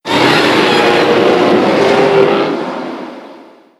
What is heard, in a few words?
dragon roar